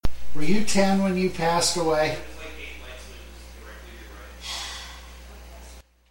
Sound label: Sigh; Labor